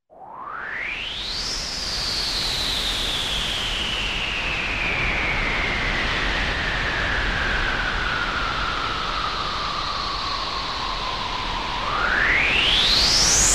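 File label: whistling wind synthesized sound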